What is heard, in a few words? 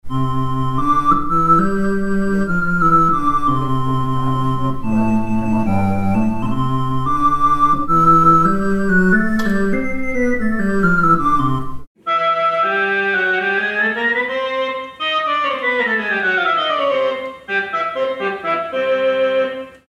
Hammond Solovox